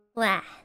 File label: wah